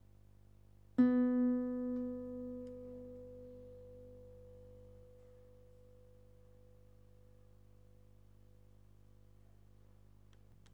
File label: guitar